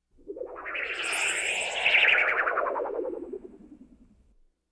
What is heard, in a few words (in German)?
Energiewelle; Science Fiction